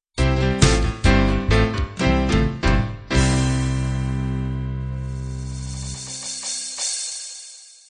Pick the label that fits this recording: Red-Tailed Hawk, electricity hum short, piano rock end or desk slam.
piano rock end